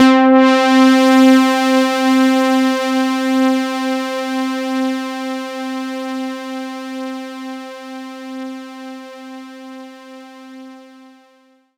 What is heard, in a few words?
C4, Synth Line; 90 s Sample CDs; NiceBeats; SynthLine VOL-31